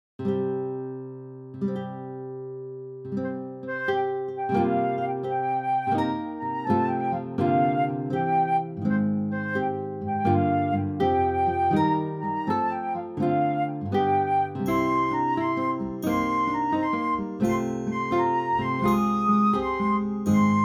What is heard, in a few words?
Backing Track